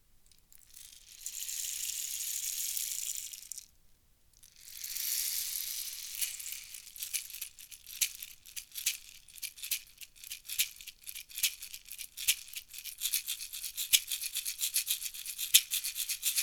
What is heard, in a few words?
ghana shaker